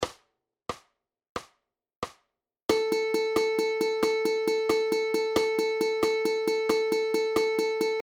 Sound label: Eighth Note Triplets